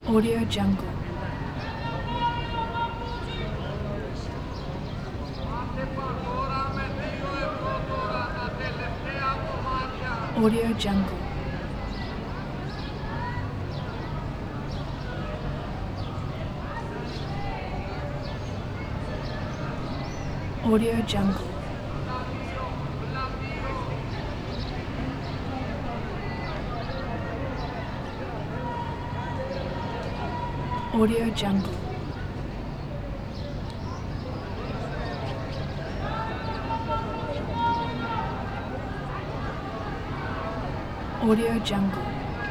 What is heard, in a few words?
greek bazaar ambience